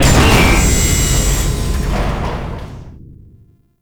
Elevator Lift Start; Warframe; Tau; World Seeder Descent

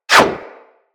Bullet Parry Metal; Impacts; Bullet Parries

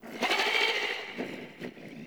Landscape Mid Creatures

